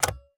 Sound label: Switch Open; Game rip; MiSide